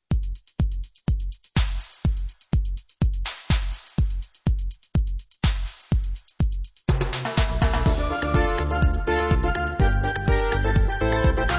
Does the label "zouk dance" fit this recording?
Yes